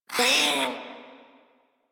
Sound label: Feral Vocal Pain; Warframe; Catbrow